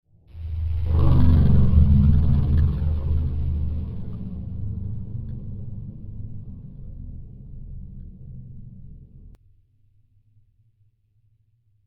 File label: Deep Resonant Sf Vehicle, spacecraft; Andrew Fleming